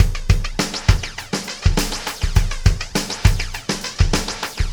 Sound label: Oscillation Beat